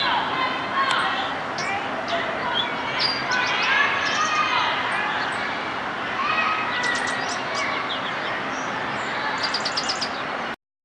Ovenbird at Fine Arts evening

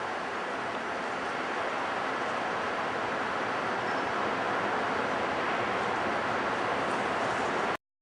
Ovenbird; OvenbirdAtFineArts2006

